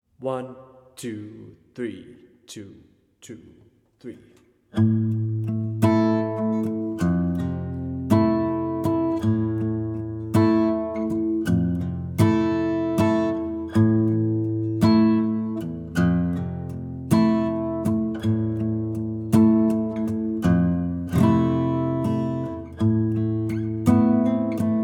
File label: Mazurka fra Ærø Guitar Steady